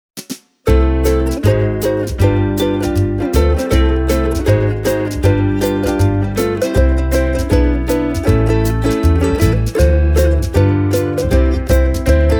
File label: Ortega RFU 10S